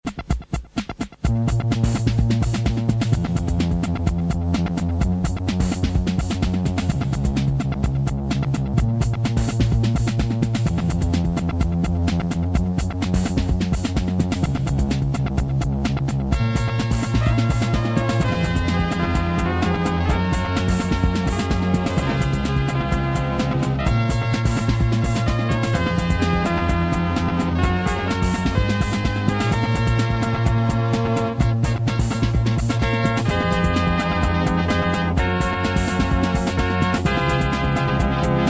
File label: start round, music